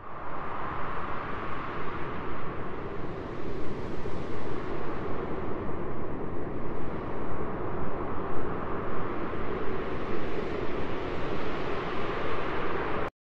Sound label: Time change transition